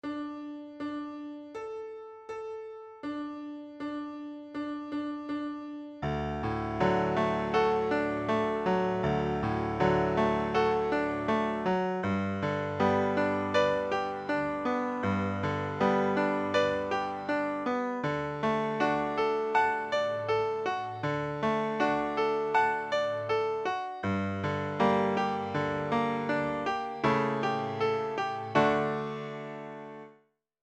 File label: Open String Tunes 80 BPM